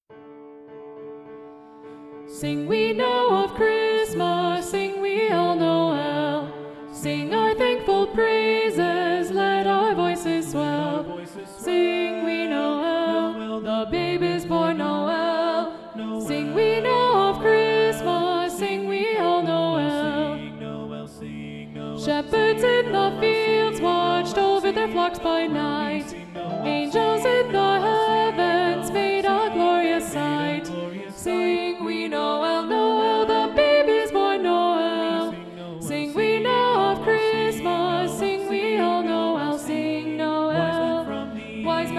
SOPRANO 2, SATB Soprano 2 Predominant; SOPRANO 1; Sing We Now of Christmas

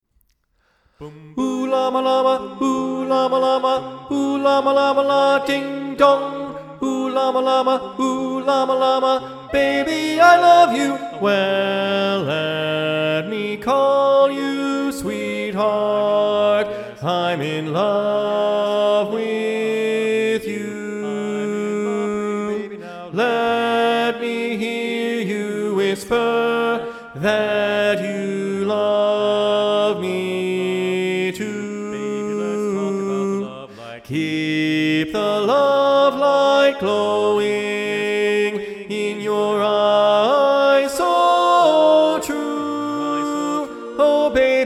TENOR, SATB Tenor Predominant

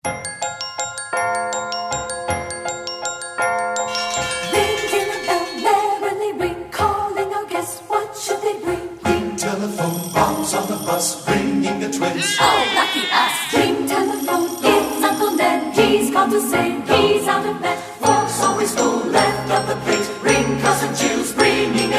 SATB Choir

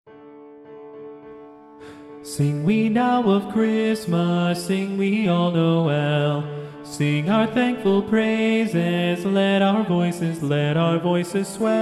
TENOR SATB Tenor Predominant; Sing We Now of Christmas

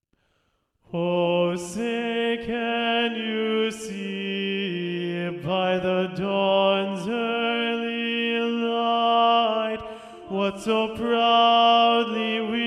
BARITONE 3 part Mixed Part 3 Predominant; The Star Spangled Banner

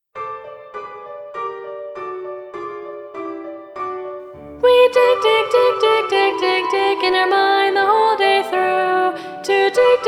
ALTO, SA Alto Predominant

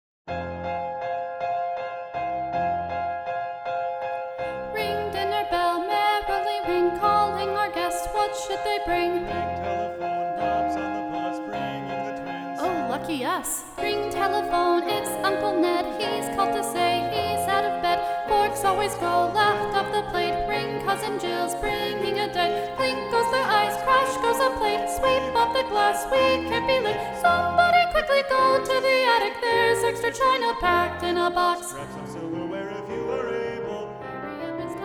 SOP-2 SATB Soprano 2 Predominant

